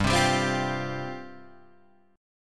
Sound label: G 7th Suspended 4th G down Guitar Half Step Down